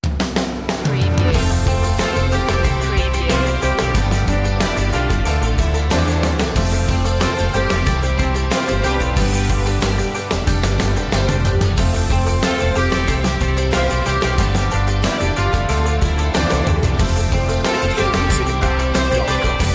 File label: open door